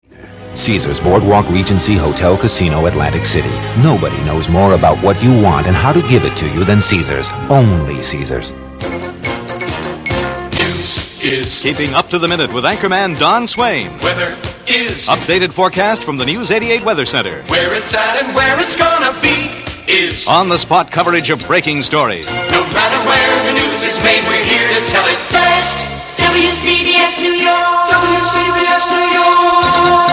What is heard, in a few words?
wcbs jingle